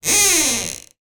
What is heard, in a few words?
household door hinge creak